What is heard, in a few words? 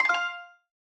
click fx tonal; soundscrate